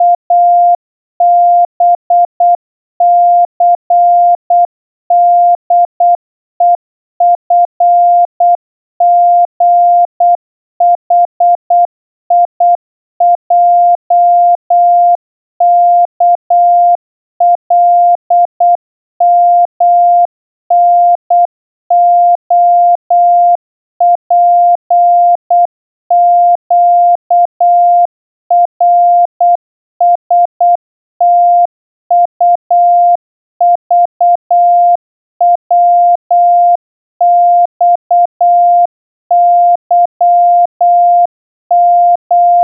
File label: morse alphabet slow